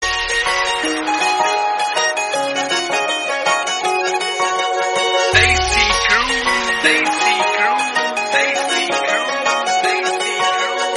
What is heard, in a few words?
instrumental